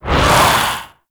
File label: Symbiotic Link Cast; Warframe; Infestation